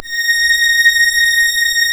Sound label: Synclav FM STRINGS A#; Big Fish Audio